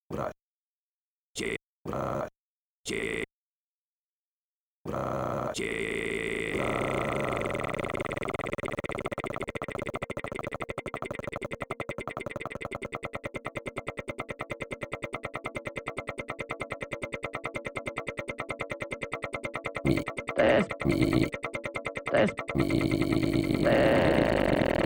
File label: samurai soundscape; wishart; TES 2012 Keynote